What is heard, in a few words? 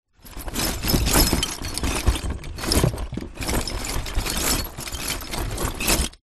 foley broken glass in cardboard box shake wobble movement